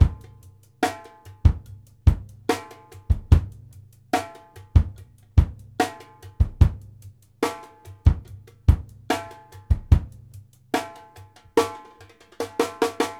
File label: FUNK KICK B, 72; Sampleheads; New York City Drumworks VOL-1